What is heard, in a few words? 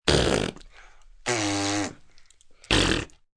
Raspberries, Mouth effects, 1 child, raspberry, mouth farts, several, human voice, kid; Sound Effects:Human; VoiceBox